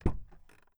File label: CAR Door Open mono; adams venture origins